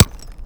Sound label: sand impact bullet; surfaces; tfa starwars melee weapons pack; nmrihimpact